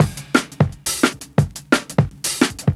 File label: DNB BREAK